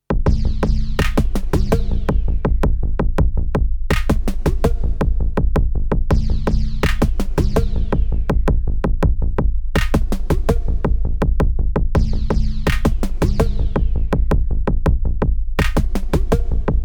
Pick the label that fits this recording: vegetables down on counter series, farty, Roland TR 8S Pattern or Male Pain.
Roland TR 8S Pattern